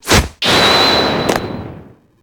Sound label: badge; bobby